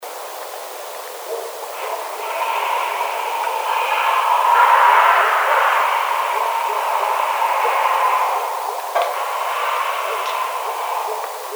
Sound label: Hissing, bigfoot